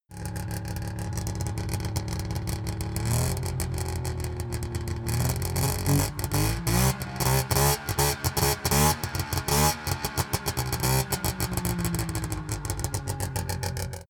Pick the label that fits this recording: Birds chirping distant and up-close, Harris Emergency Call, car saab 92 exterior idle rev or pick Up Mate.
car saab 92 exterior idle rev